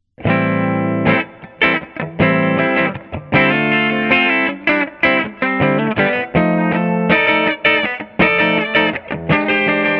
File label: clean bridge series, clean – bridge-PU in series; Manne Ventura